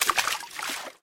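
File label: splash from a thrown cobblestone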